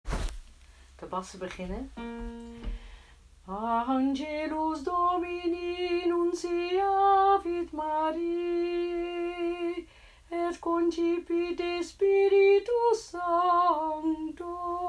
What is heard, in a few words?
Lead